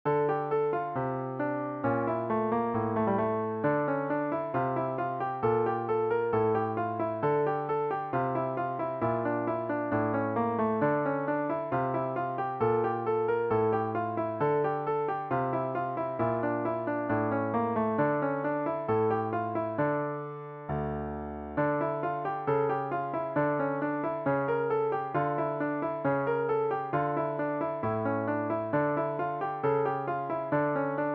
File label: sonata piano; Santos, Jose